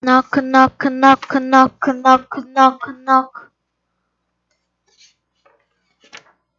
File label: knock